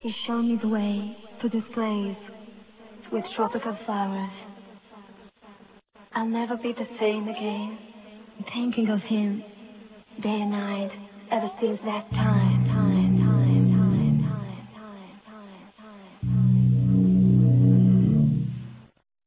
clubmix

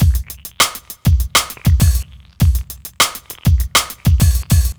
d'n'b loop 100bpm; dr.dezim

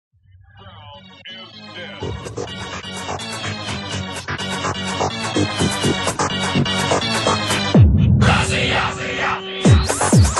tune

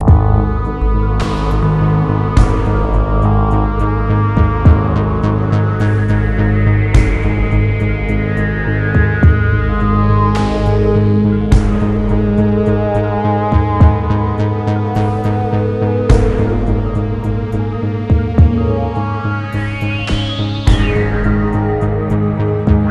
Cut-off behaviour

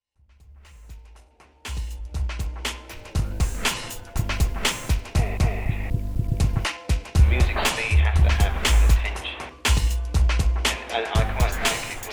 Tense Taut

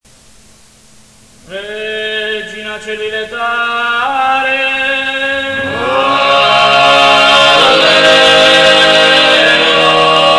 regina coeli

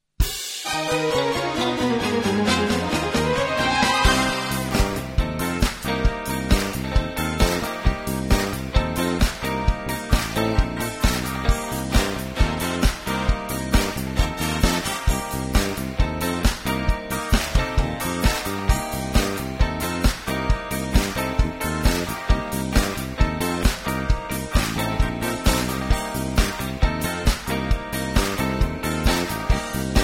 Disco

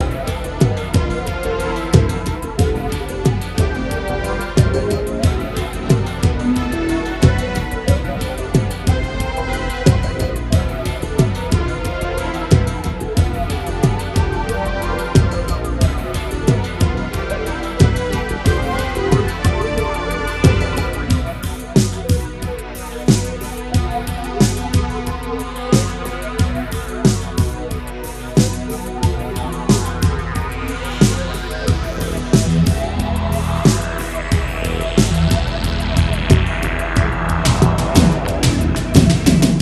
Negative feedback

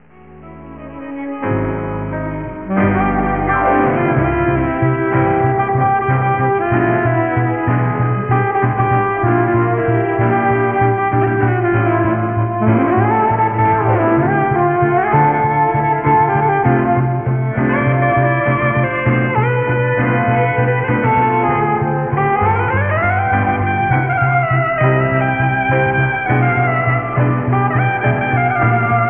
music

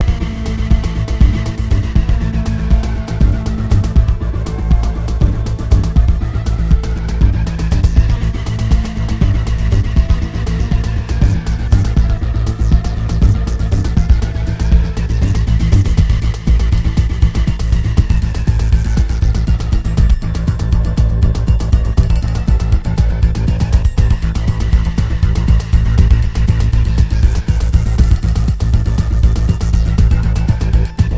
Pitch edge

